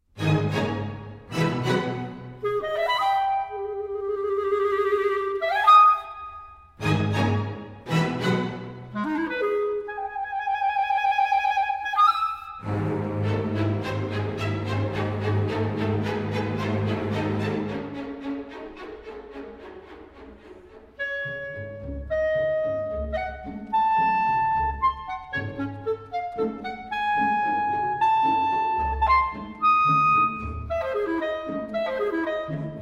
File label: concerto for clarinet and strings; blank